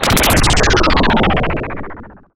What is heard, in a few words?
proteum missile moderate